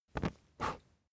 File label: match blow out